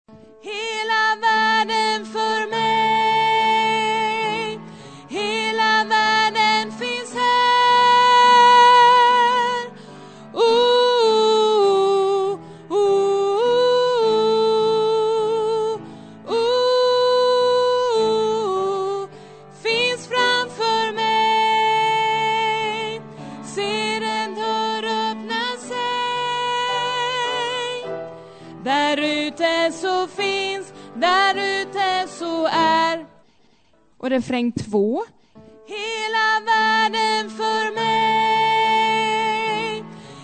hela varlden for mig bas

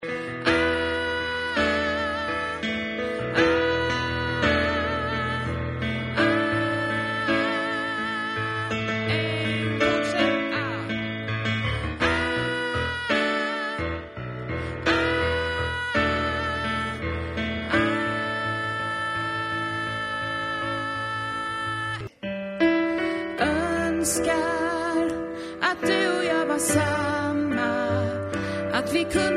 tänk dig sopran, stämmor

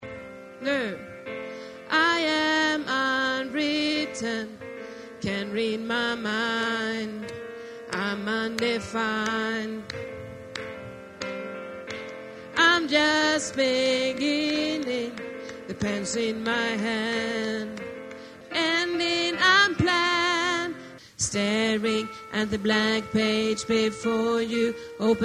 alt, stämmor

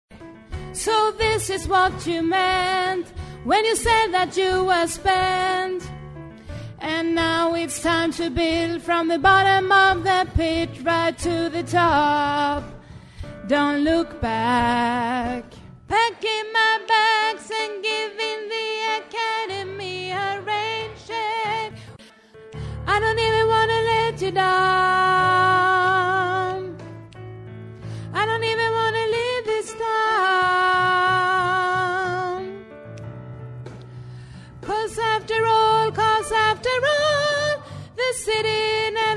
its time bas

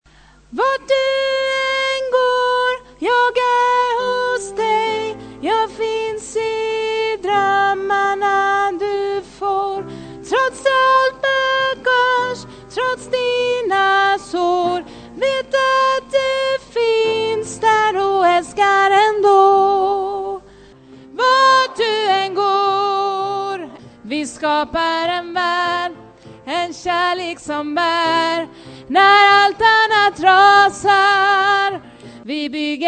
vart du an gar tenor, stammor

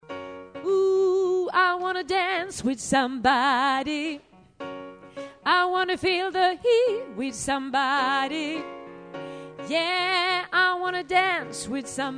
alt

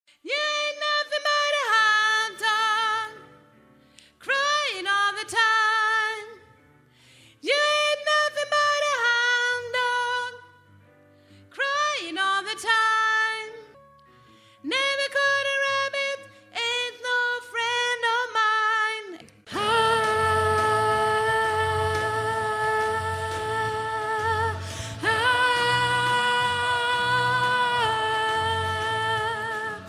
bas

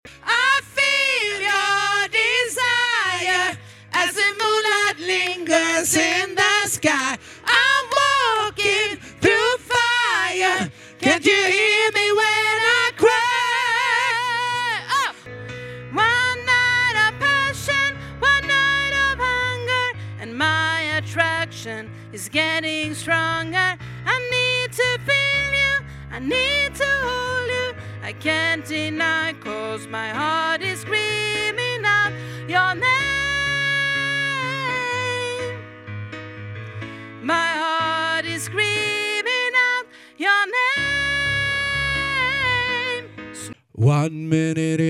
bas, stammor

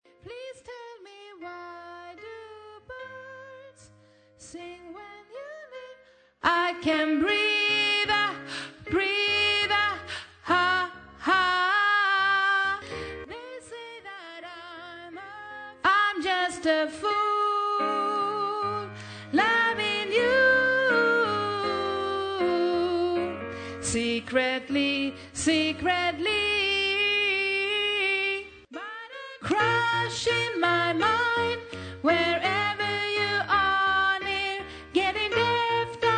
the day after tomorrow sopran, stammor

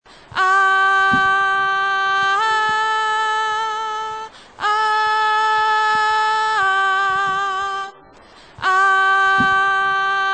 vingar sopran, stämmor

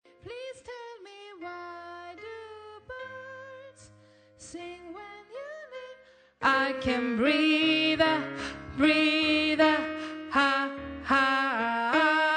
the day after tomorrow alt

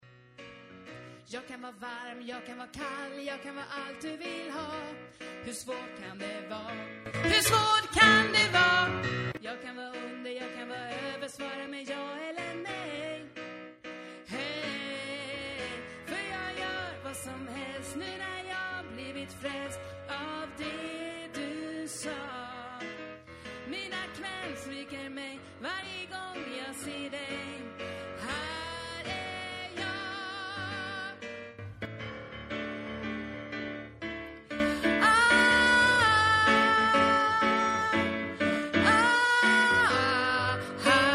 alt

